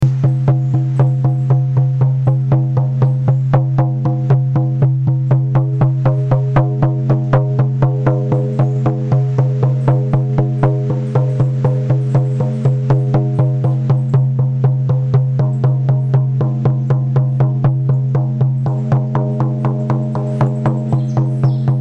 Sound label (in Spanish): tambor; duende